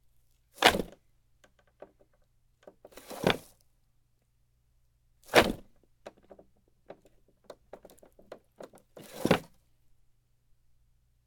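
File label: Boat Pulley Fast Pulley Tension Release with Wood Creaks Series; Secret Source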